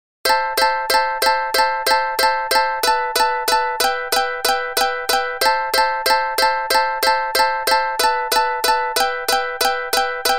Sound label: Ringtone